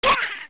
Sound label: Stalchild Hit; zelda; OOT